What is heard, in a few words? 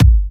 Soft Kick; Vengeance Samples